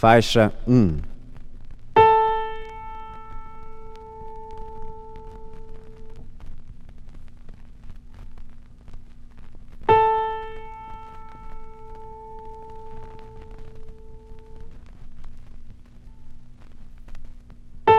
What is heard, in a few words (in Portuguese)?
violao guitarra